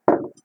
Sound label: Cup